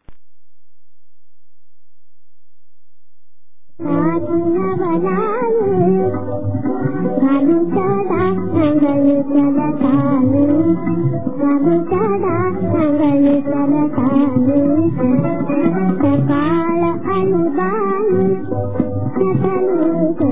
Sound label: marathi duet